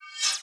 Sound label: Grave Sword; Sid Meier's Civilization 4 Complete; Beyond the Sword; Units